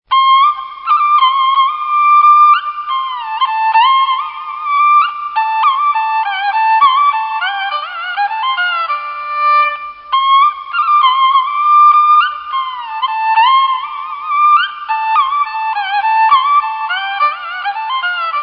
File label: Banhu Sample Sound